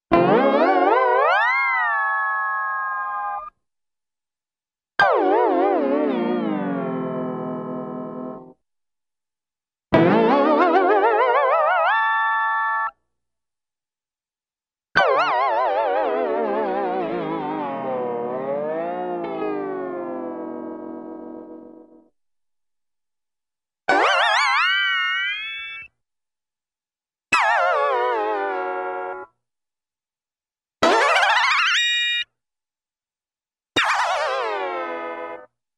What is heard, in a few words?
Ascending & Descending Elongated Guitar String Slides; Hollywood Edge Cartoon Trax